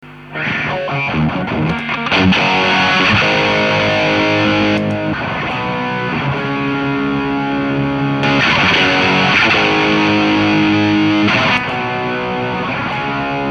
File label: LAV BOX, CLEAN DRIVE; HAO; SD-1 LEV7 TONE10 DRIVE8; SD-2 LEV4 TONE6 DRIVE6